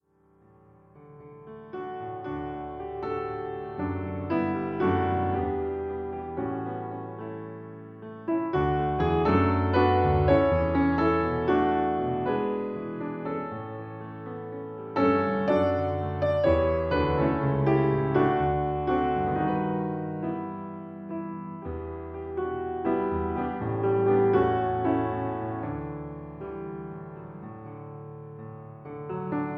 Solo Piano

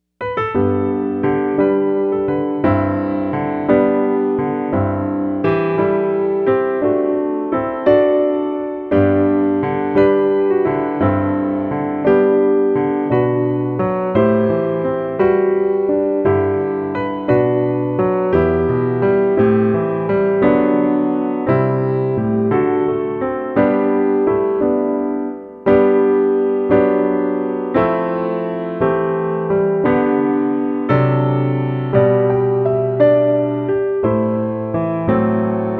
Piano Acc

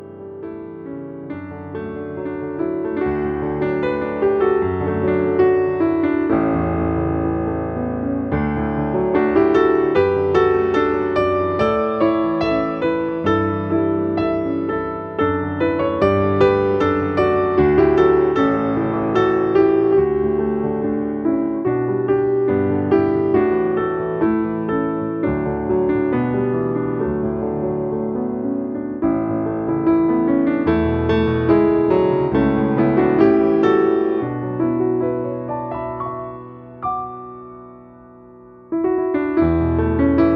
Guidance Solo Piano

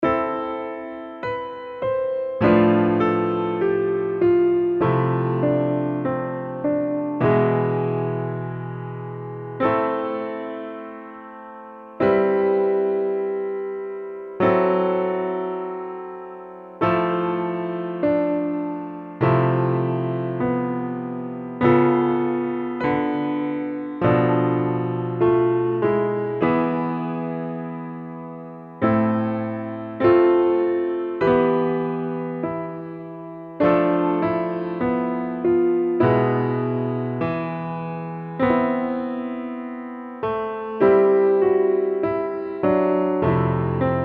PIANO Acc HIGH

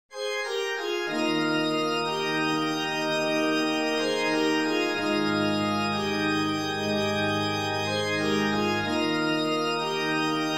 organ medhigh; study

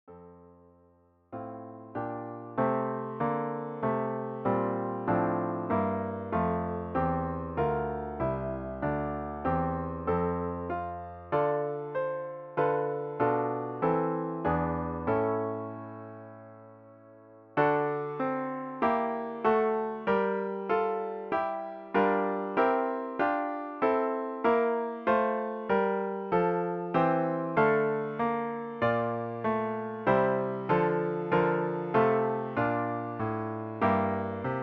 hymn F Med

